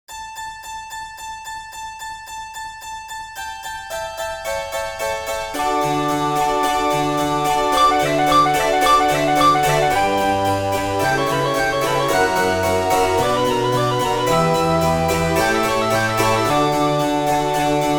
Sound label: Gloria FLAUTO cemb org 2 voci ORGEL och CEMBALINO KOPPEL; Cembalino & Organetto part; harpsichord